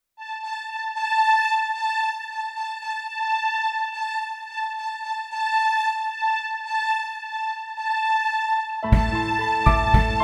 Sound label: w Piano sample